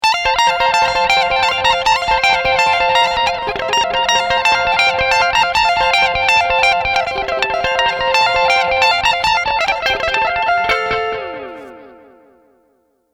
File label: Small Delay